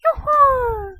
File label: Sound Smile